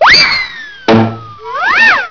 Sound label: ZING BONK